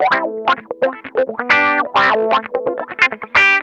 WASPISH, Funk Guitar; Zero G - Funk Guitar

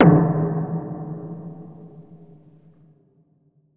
Percussive Stab

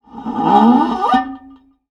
Raw, contact mic, Tin Of Guitar Strings; musicradar; contact mic madness

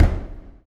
STOMPS; Spectrasonics; Vocal Planet; Blues Soul

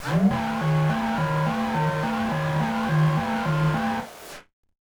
Battered Synth; malfunctioning synth samples

